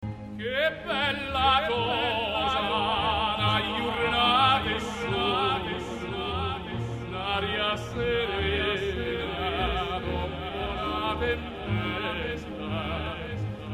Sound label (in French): O Sole Mio tenor avec echo